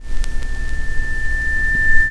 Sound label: Fx Vox; Equipped Music; Loungin House